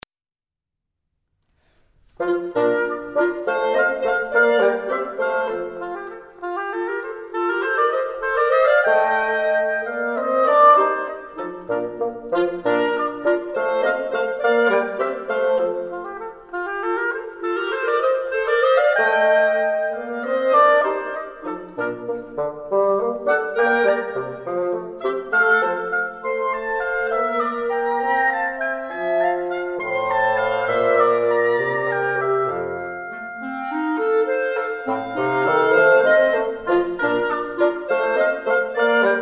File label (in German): Trio Mignon Mozart Divertimento